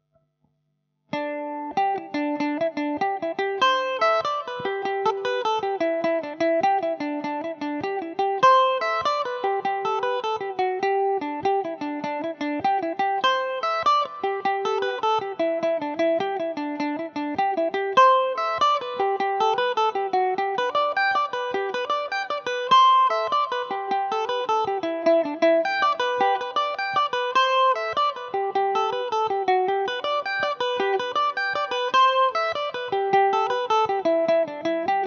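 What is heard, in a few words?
jig G major mandobird, irish mandolin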